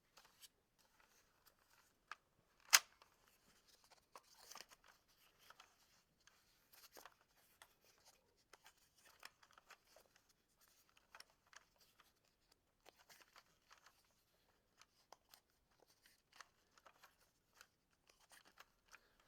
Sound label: gun loading foam